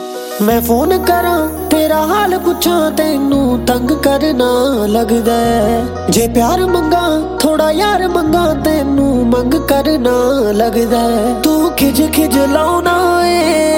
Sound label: Sad Punjabi Song Ringtone